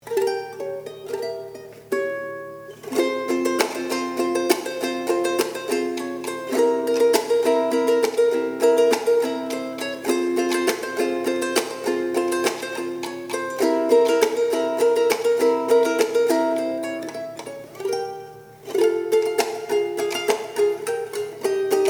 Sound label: Eastern Uke